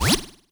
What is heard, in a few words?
teleport; Crypt of the NecroDancer